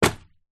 household telephone directory yellow pages drop down